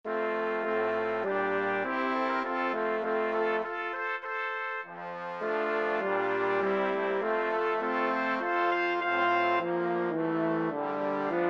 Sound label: Brass Quintet